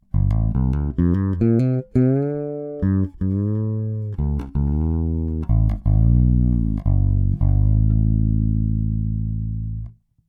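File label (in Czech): Baskytara Cort B4FL, bezpražcová, 4 struny, Ernie Ball Cobalt Flatwounds (hlazenky)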